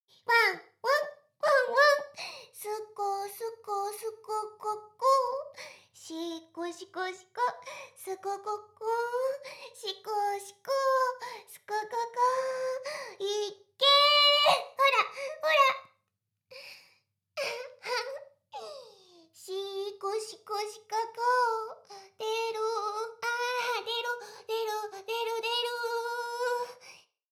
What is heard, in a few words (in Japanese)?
しこしこ, ASMR; 全妹計画２; メスガキ妹; 全妹計画<オール・シスターズ> 2妹め